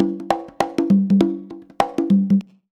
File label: CONGA, Modern Percussion Loops; Soundscan vol.56; AKAI; CONGA 10G